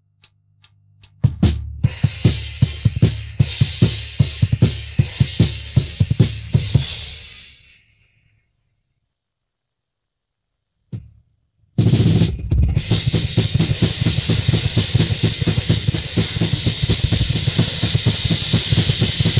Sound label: drums